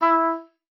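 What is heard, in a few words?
E4, oboe d'amore; Xsample VOL-7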